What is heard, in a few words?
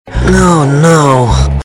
lose; spyro